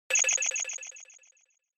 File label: Short Accent Low Delay; ショートアクセント; 低 ディレイ